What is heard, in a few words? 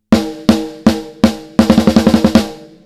Snare